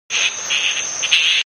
BATS; Animals; Partners In Rhyme

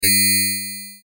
Beep Tone; Multimedia Web Interactive; Beeps (Sound Effects:Multimedia)

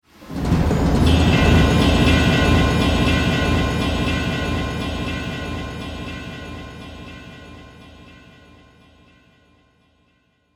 Drums Cinematic Stinger; Sonic Logo Sound Effects

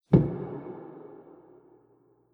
Cave Impact Soft Thud; Miscellaneous Impact; Andrew Fleming

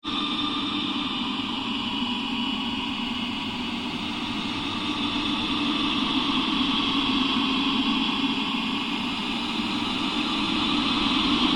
Created Undulation Drone; sound building blocks created through manipulation of raw material; Rise And Dive

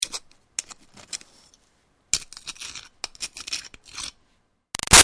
Foley Rock Picking Archaeology Moves; Rocks; Materials

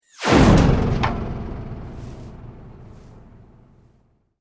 Sweep Mechanical Medium

